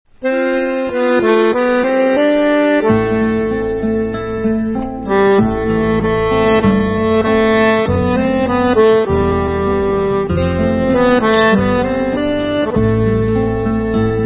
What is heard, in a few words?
folk instrumental